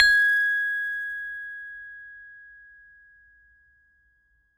Saron P, Gamelan

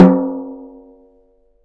Snare Drum; Percussion Samples

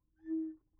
Bottle Blown

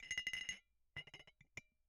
Bottle Struck

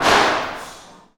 Stairwell R

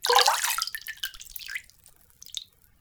Dribble, Water Dribble

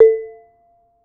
Bonang, Gamelan

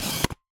Camera Single

